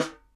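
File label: snare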